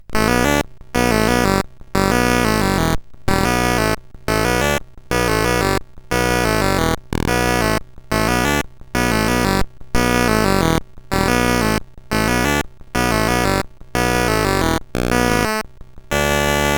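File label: Musicman, MOS 8580 SID chip music, games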